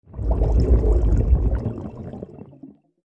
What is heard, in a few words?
bubbling mid freq